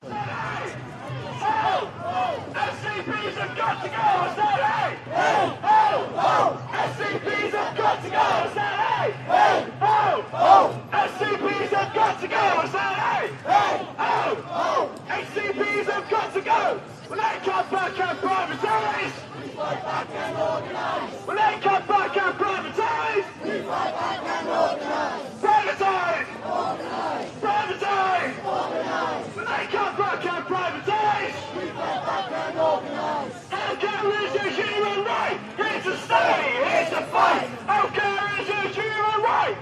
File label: atmos protest walking long chants megaphone nhs; london music mixing